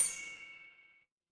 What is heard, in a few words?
instrument chime bar